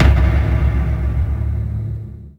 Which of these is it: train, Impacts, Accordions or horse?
Impacts